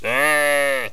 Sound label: sheep; megaglest